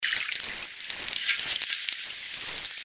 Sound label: SW, dolphins signatures; Domino